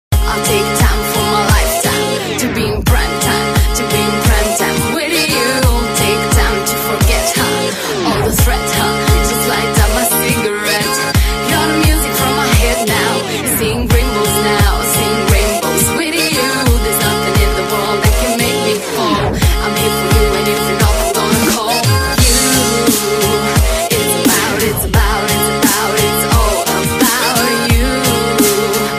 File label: Best Female Dubstep